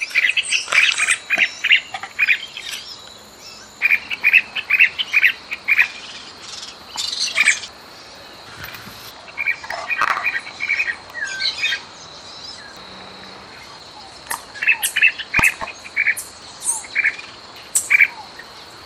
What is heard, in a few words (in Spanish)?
Lavandera enmascarada, Fluvicola nengeta